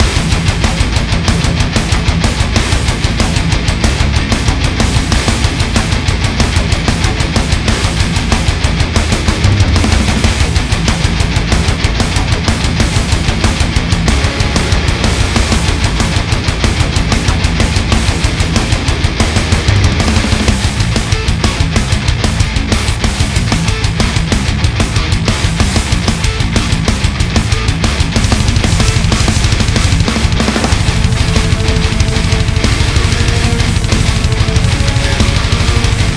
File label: Rock; Barotrauma; DynamicEuropa